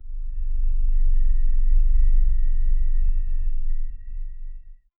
a deep low frequency rum; paranormal

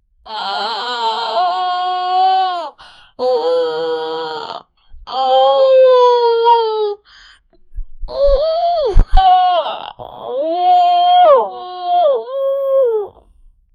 waaah waaah waaah huu huu, baby

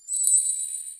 short bright, birth; cry

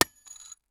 stone pickaxe striking; mining

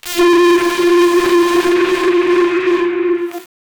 distorted echoing, experimental

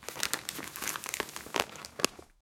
pick up item sound, packing

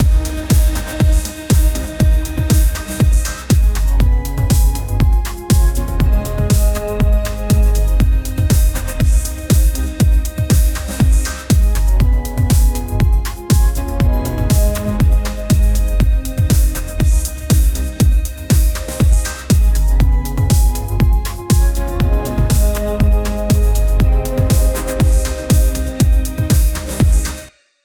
cinematic epic beats

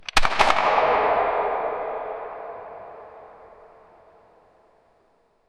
the sound of a cartridge, explosives

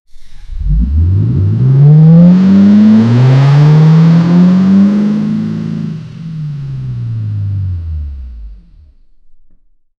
ford f150 raptor with ful, sportscar

